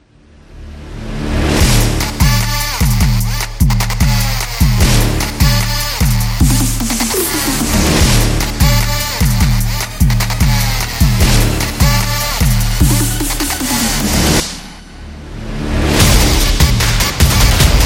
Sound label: Trap Intro